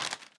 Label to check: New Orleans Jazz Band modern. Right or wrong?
Wrong